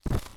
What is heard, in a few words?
Snow Step